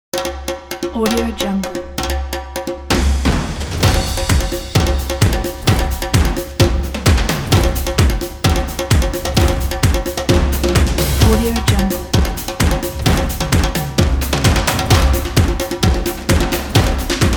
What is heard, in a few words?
epic cinematic percussions